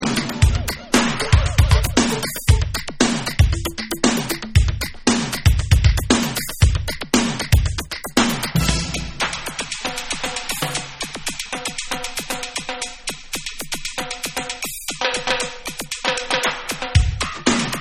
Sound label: Instrumental